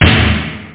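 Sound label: natural snare; OctaMED SoundStudio V1; jazzies samples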